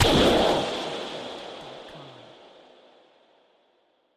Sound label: anime burst